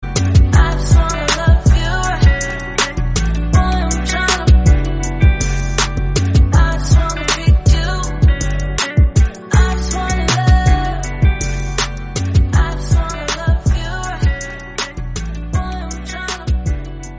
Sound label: Instrumental BGM